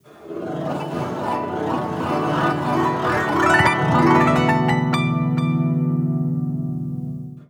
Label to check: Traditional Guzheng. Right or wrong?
Right